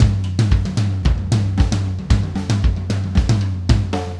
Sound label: Beat, Hip-Hop